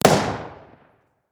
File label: gunshot